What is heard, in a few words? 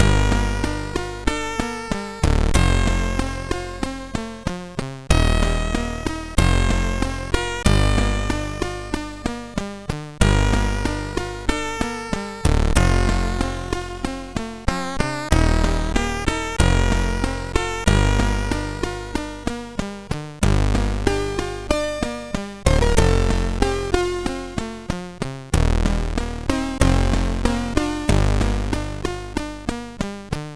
Plotter Tune, MOS6581R4